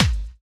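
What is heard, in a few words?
snare drum bass drum, Dance Mega Drums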